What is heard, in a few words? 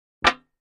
Aluminum Pan Single; barrels buckets & cans